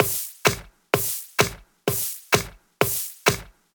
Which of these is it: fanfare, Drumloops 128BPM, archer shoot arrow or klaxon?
Drumloops 128BPM